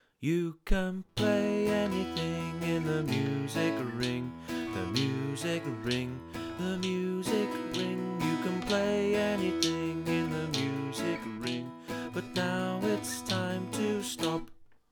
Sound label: Full Song